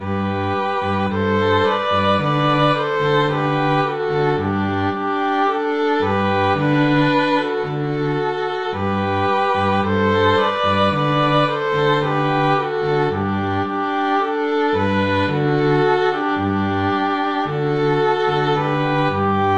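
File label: ode to joy 2 violins violoncello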